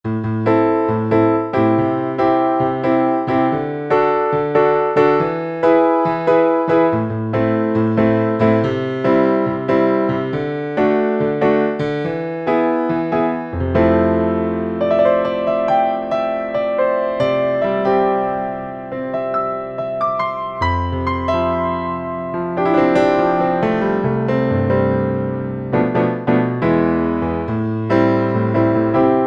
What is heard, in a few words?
PIANOS and KEYBOARDS Grand Piano Punchy; Apple GarageBand 2